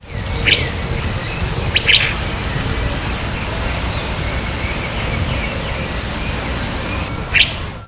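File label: Elaenia mesoleuca, Parque Ibirapuera SP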